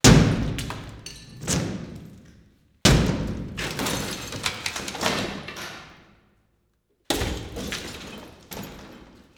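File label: Board Wood Glass Debris; The Sound Catcher; The Abandoned School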